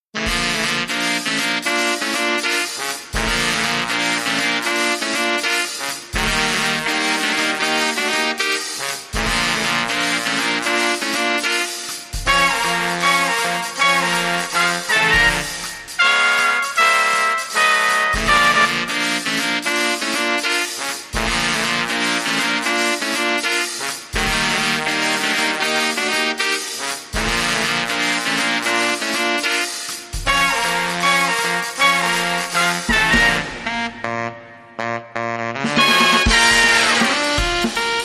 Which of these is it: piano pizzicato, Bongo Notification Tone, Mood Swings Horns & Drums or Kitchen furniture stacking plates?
Mood Swings Horns & Drums